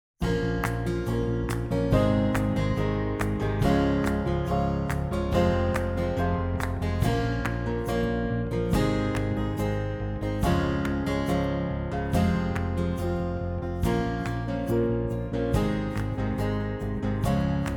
Modern Folk Exciting; Auto Compose; amperAI

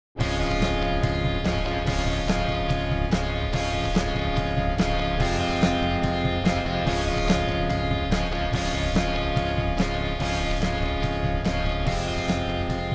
Classic Rock Playful; amperAI

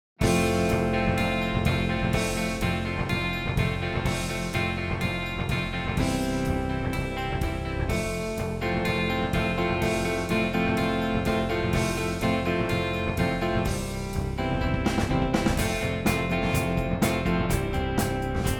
Classic Rock Happy; amperAI

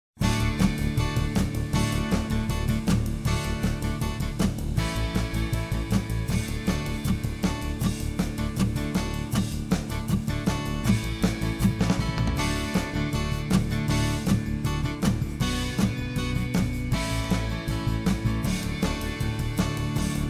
Classic Rock Exciting; amperAI

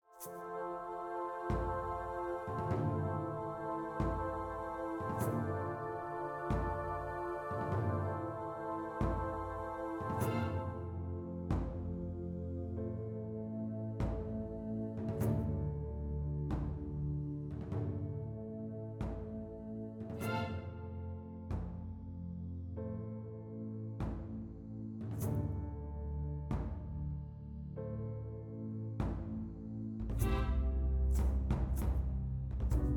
Cinematic Uplifting Atmospheric; amperAI

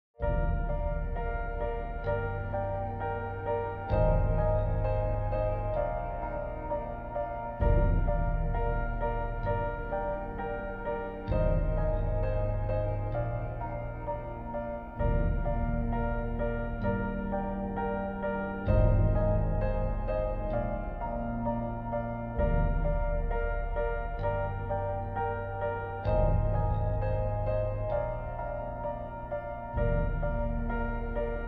Cinematic Uplifting Atmospheric; amperAI

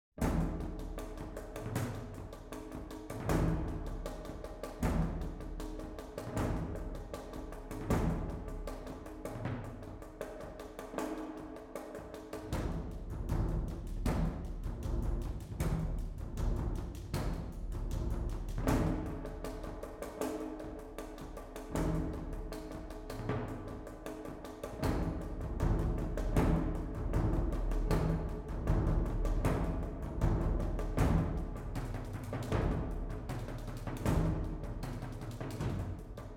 Cinematic Epic Percussion; amperAI

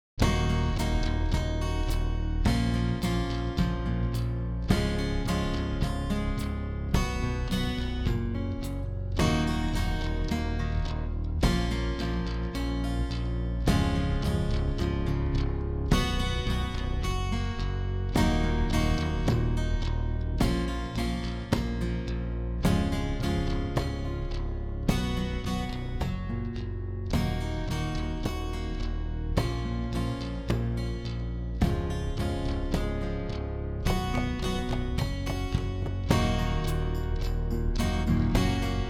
Modern Folk Brooding; Auto Compose; amperAI

